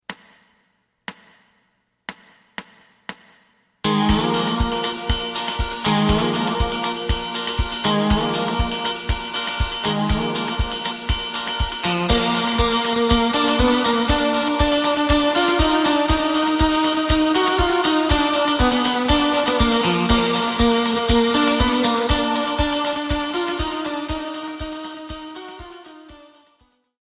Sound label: bass; ハバナギラ